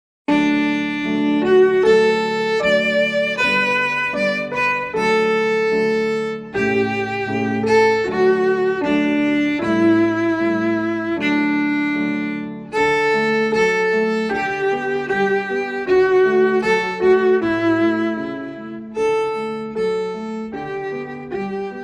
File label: May Song. Folk Song